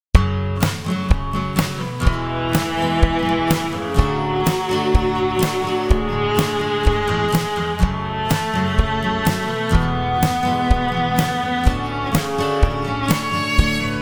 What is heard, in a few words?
Viola